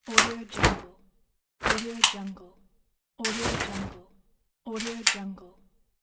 umbrella

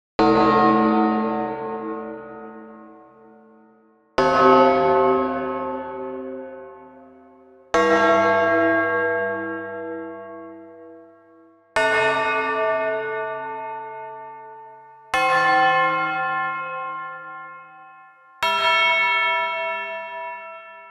cathedral bells; 8station

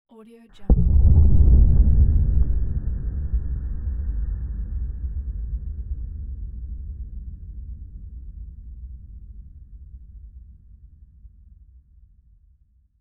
underwater explosion

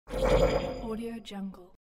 magic debuff

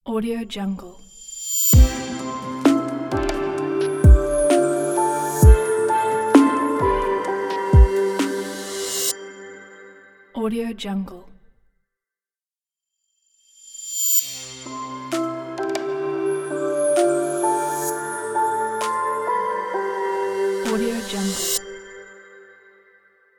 intro logo reveal

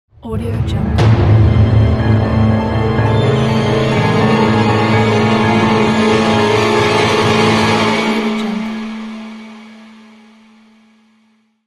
scary logo

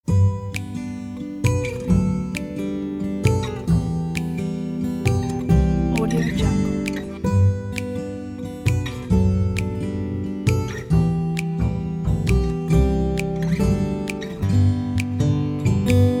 positive acoustic

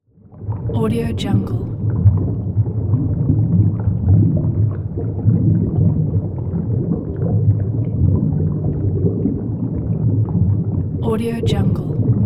underwater ambience

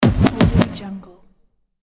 vinyl scratch; bekibeats

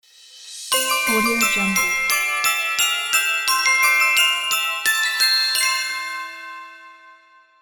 christmas bells logo; okstockmusic

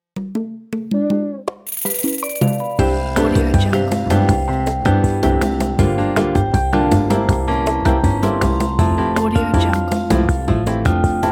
nice pop relaxed logo pack

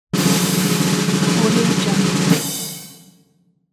drum roll

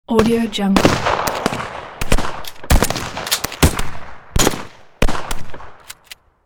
shotgun and rifle battle; sound-ideas

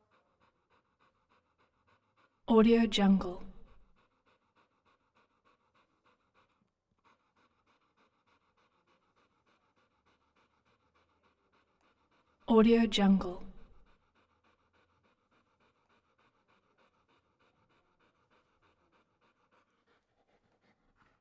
mutt panting; collanderson

